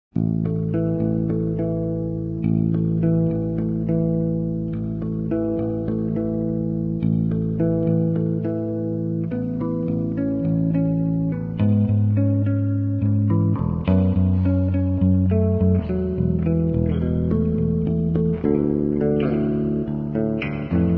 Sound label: Guitar Electric Bass; Zomboid; Lifestyle